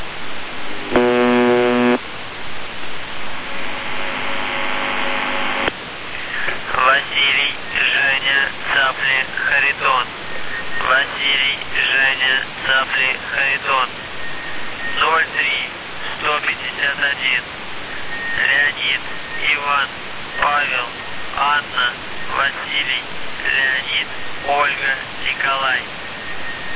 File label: buzzer usb msg